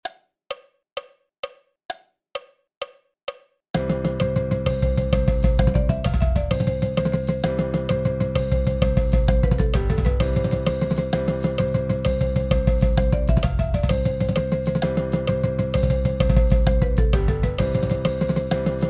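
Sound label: 130 BPM Cheesy Rolls with countoff, Marching Percussion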